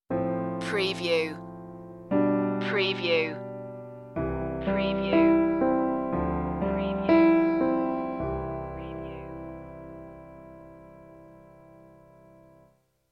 my heart stinger, A softly tender solo piano piece. Touching, romantic; solo instruments; acoustic piano